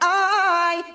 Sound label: eye SUNG; ooh ahh